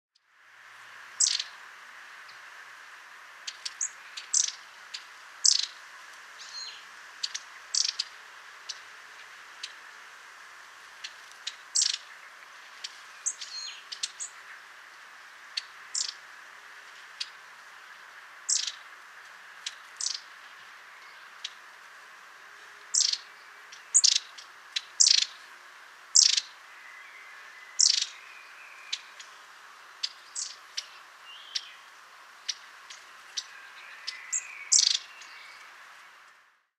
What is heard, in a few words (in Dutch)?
staartmees roep; raniuszek; peter boesman